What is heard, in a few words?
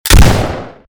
protecta fire; STALZONE